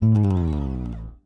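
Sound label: Yamaha slide, Bass; Masterbits CLIMAX 7; PATITUCCI BS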